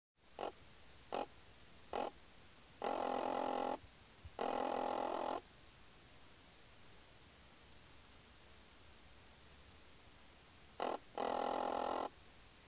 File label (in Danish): egegræshoppe